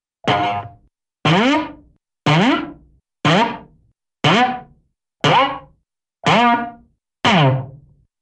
Several Short Twangs Or Boings, Cartoon; Hollywood Edge; Cartoon Trax

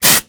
Aerosol Can, Bathroom; 1001 Sound Effects

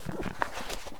Book Open; SFX Kit; Foley - Misc

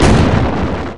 Cannon Turret Shot, Weapons

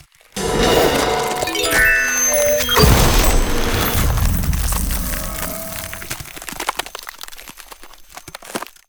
Ice Spell Healing Healing Wave; Healings; Arctic Aura

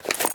Gun Draw Select Weapon, Weapons Foley; SFX Kit

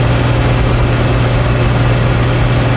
Truck Semi Loop

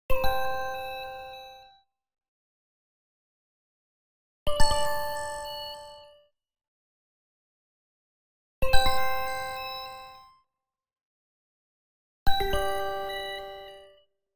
Arcade Jingle Neutral

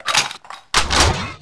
Gun Reload Scifi, Weapons Foley; SFX Kit

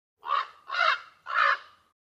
Raven; Inquisitor 3

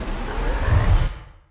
Punch Left Speed Up; Pitch Black - A Detective's Demise

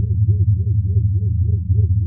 Scifi Hover Sentry Loop; SFX Kit; Sci-Fi Loops

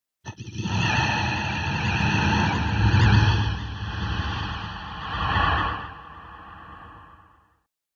Demon Growl Hover - Zombie Breath; Hollywood Edge; sound designer's toolkit v2

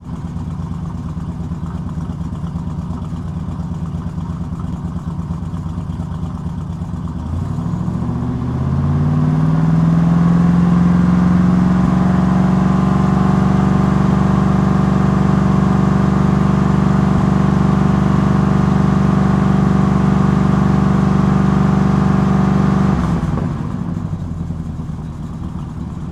68 Road Runner Fast Idle, Automobiles

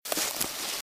Footsteps - Bush walk - Right; Eden; RadwinIsland

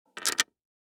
DICE on Hard Wood, Grab, Standard, Six Dice; Samples; ArticulatedSounds; DICE Sfx Party (Extended)

